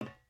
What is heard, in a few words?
step metal plate